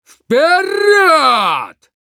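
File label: battle cry, Russian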